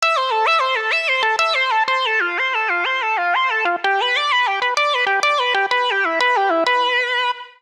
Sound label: Multi Solo (Am) 126bpm; Music Loops; Singularity Multi Solo; Ableton; Factory Packs; Singularities